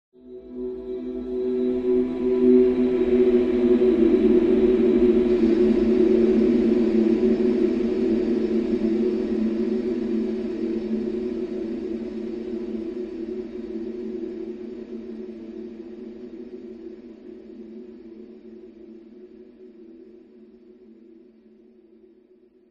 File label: horror swell ghostly whining airy long reverb; zapsplat